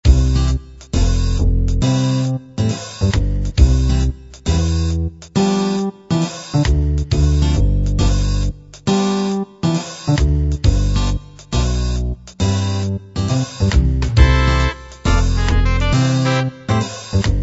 bounce

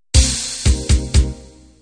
8bt analog end

